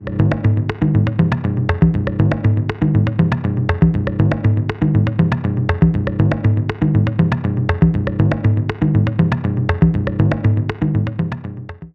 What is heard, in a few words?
two instances afro